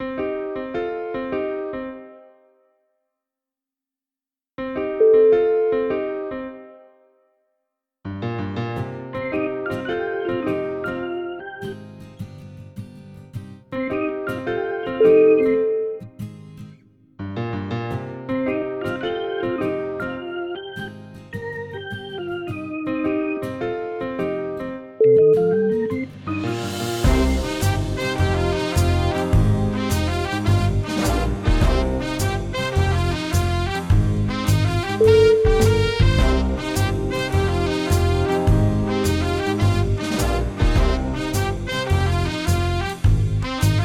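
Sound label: broadway jazz, music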